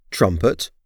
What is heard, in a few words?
trumpet